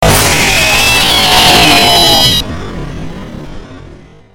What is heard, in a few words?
jumpscare sound; five del mzggie morning